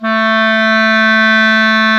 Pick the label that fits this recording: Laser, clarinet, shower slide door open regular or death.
clarinet